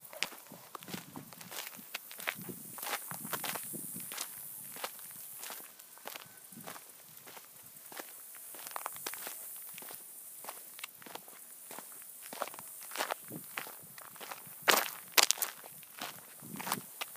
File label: Walking On Gravel